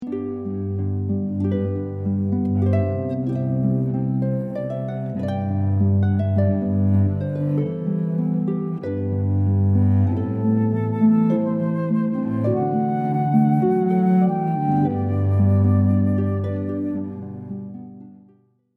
harp flute cello

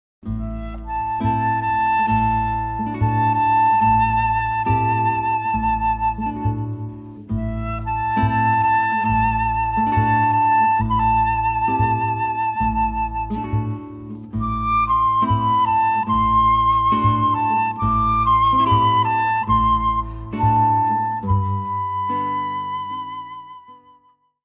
guitar flute bass trio

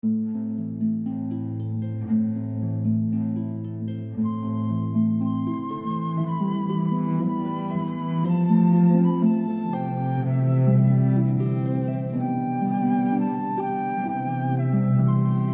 harp flute cello

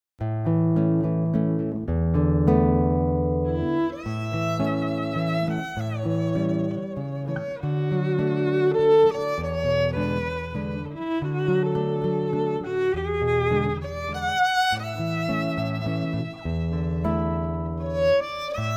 Duo of, guitar violin

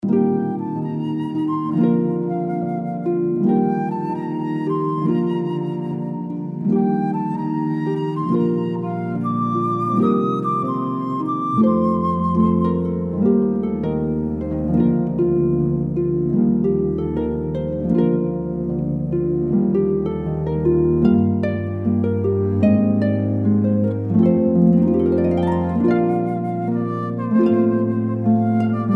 harp flute cello

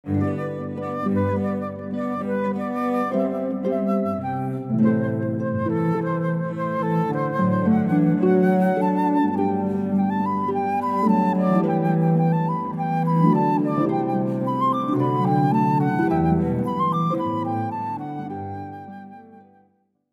harp flute cello; Sheep May Safely Graze, Bach

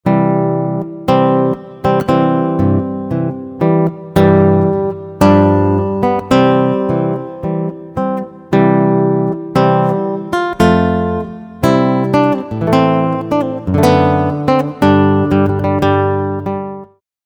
Bridal Chorus, Solo Guitar; Wagner

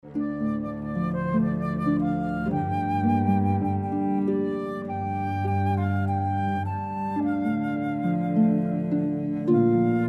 traditional, harp flute cello

